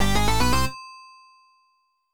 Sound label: Fanfare; Viper V12; OPN; PC-98